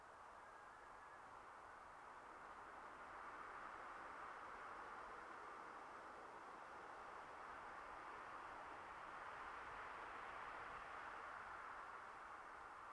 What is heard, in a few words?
ambient Inside Wind; Zomboid 41.78.16; Project Zomboid